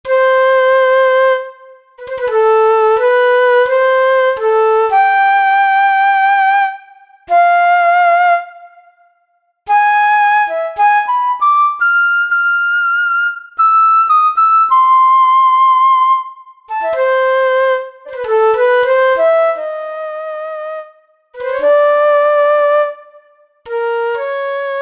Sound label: Real Flute